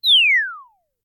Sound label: fall; 落下音・はねる音